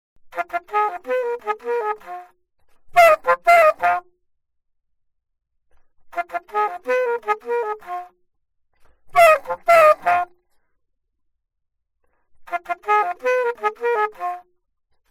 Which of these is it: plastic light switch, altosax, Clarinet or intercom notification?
altosax